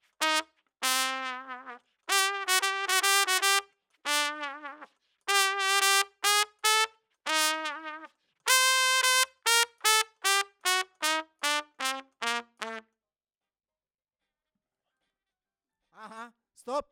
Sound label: Trump; RE20